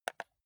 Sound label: console controller home press